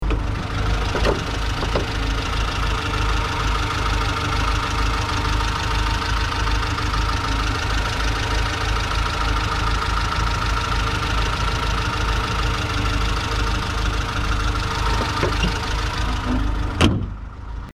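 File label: car hood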